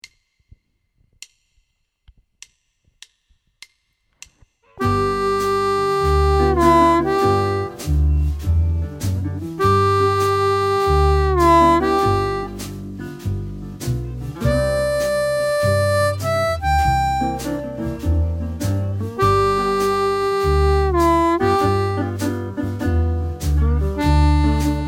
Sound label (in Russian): Blues harp, Быстрый пример